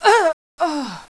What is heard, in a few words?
shot, female